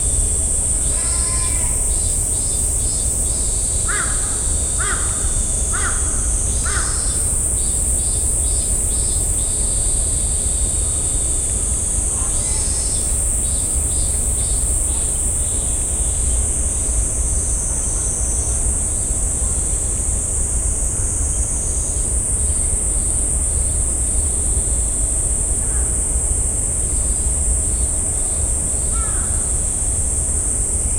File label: Binaural; ZOOM F6 MultiTrack Field Recorder